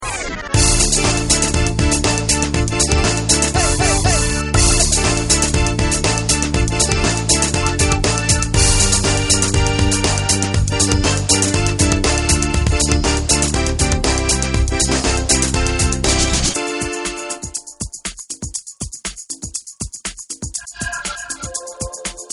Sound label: lezginka, dance